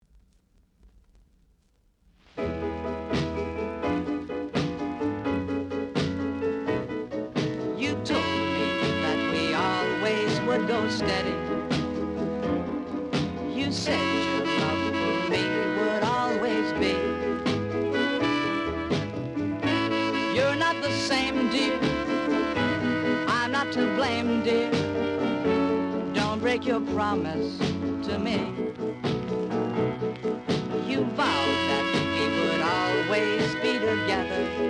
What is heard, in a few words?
break